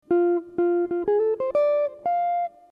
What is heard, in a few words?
blues